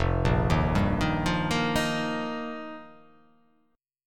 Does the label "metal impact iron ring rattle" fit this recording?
No